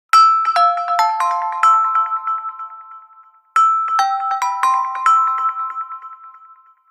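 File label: Ring, ringtone; Samsung; GT-i8700 Omnia 7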